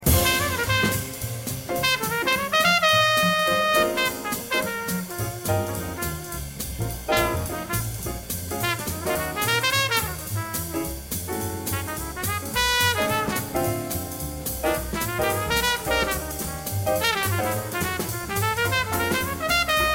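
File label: trumpet solo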